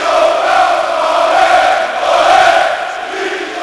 Chant, Crowd; David Beckham Soccer; XBOX

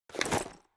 Switch Weapon; Weapons

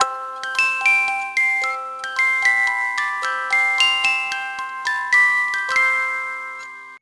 Music box; Max Payne

